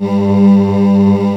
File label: choir